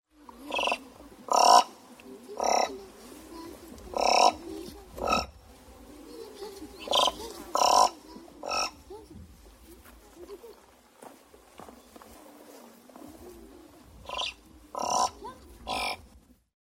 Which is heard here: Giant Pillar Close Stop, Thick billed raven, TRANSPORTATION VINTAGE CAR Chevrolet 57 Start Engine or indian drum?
Thick billed raven